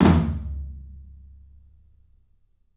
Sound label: TOM, 3D Drums, CLUB TOM; 3D Sound; CLUB 14TOM